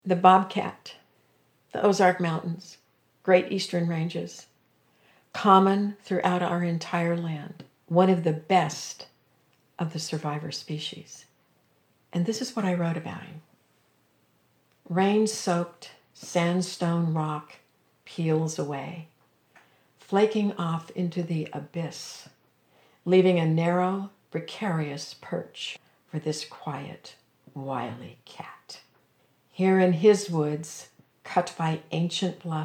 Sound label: vocal only; The bobcat